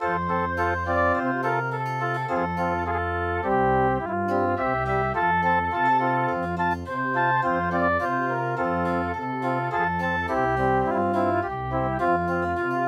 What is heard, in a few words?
FULUTE, midi; OHOSHI